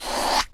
zoom in; Pump It Up Exceed

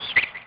amb bird; Tony Hawk's Project 8; XBOX

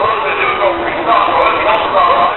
Speaker; Decors; Furious Karting; XBOX

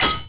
pipe impact concrete; impacts explos

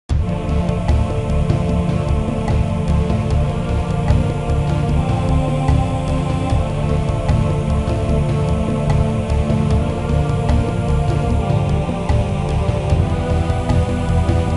Menu; XBOX; Commandos 2 Men Of Courage

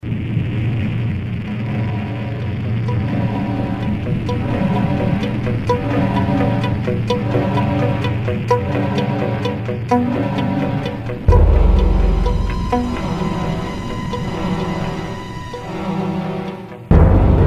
slow, music; Soldier Of Fortune II Double Helix; XBOX; kamchatka

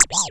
Robot Oneshot; BedRoom

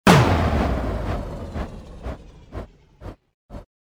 fan off; Soldier Of Fortune II Double Helix; XBOX; ambience

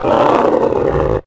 Roar, Dino; Acro

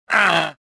pain; jedi

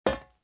metal run, footsteps

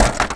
ollie metal, terrains; Tony Hawk's Project 8; XBOX

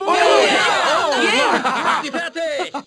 foul, Crowd German

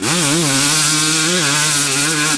Power, Engine

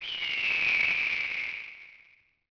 eagle; Ambient; MX vs ATV Unleashed

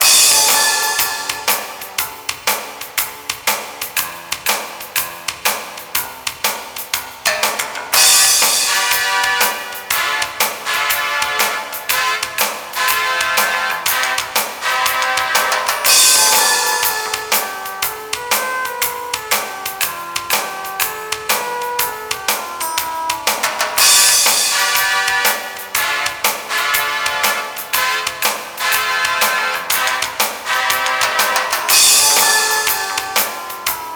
bowling tune; Spider Man 1; XBOX; ENGLISH

